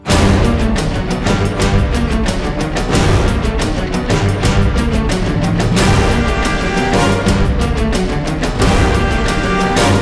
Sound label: Preludio Percussivo